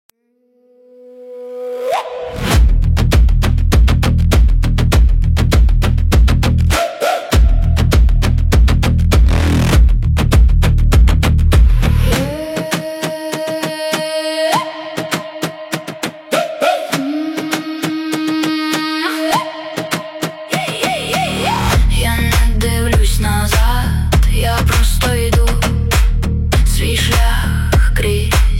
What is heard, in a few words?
Ukrainian Rhythm